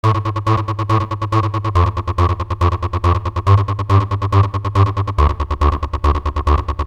melo, party

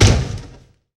wood crash; svencoop

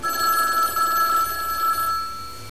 PHONE; subway

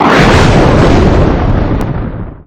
thunder; crampman

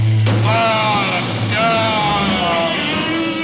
doors; fugedup; cstrike

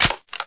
aug clip in, weapons; svencoop

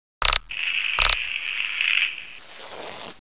scorp idle; svencoop

